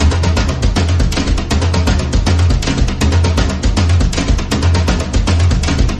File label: drums; horde